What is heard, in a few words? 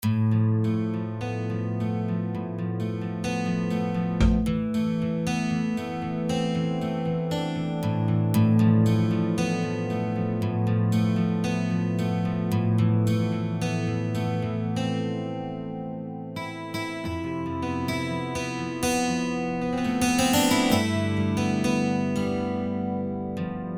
Instrumental Improvisation